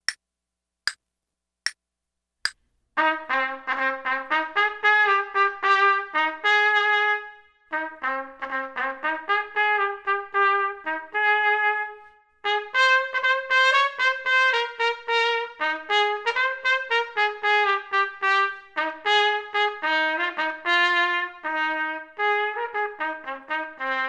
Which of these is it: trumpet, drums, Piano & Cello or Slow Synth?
trumpet